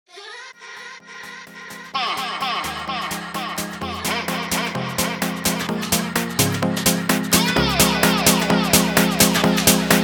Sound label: Fm House UKG keys; 3pack piano bundle